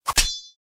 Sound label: equipment forging